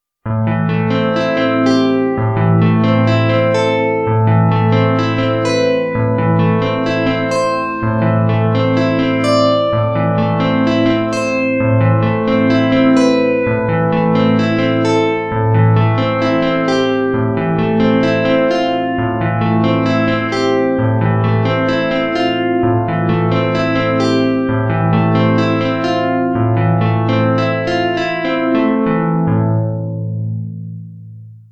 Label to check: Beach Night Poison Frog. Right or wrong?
Wrong